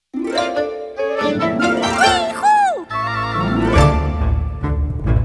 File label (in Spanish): Fanfarria Victoria 1 Estrella; magic twins